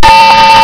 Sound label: Radar Online; Emperor - Battle for Dune